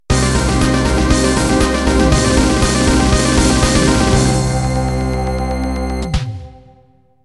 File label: Dream end